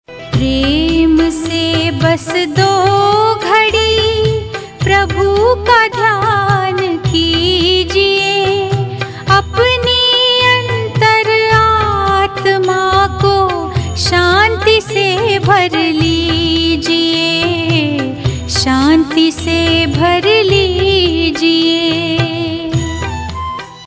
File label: Prem Se Bas Do Ghadi